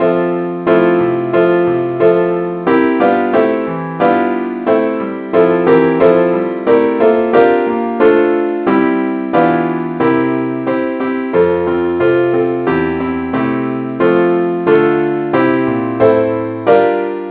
keyboard key f; saintthomas

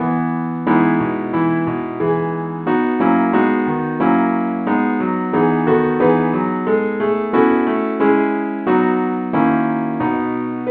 keyboard only key E; SAINTTHOMAS

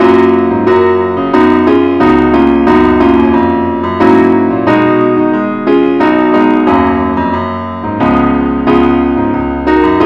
ineedthee keyboard only key fis

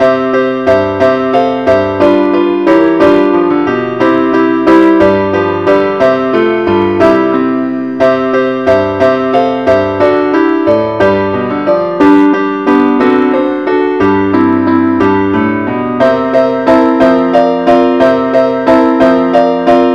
keyboard only key c; angelvoices

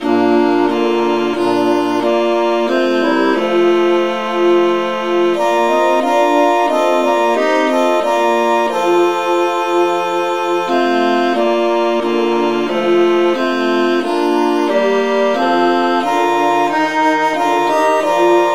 traditional key bes; SAINTTHOMAS

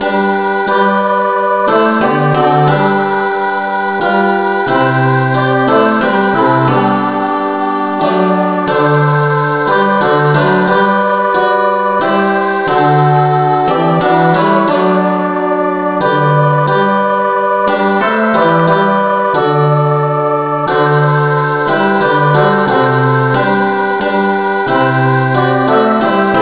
no vocal key g

